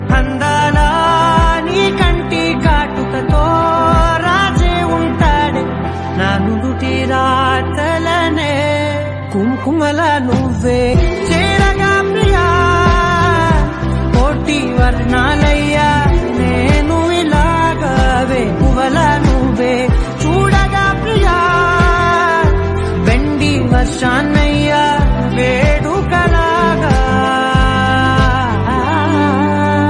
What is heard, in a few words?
Telugu Love Ringtone; Brahmastra